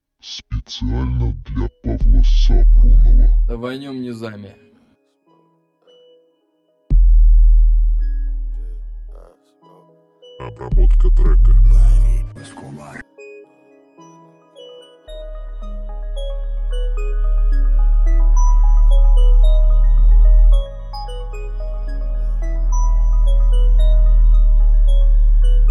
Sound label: 20-22hz, Low Bass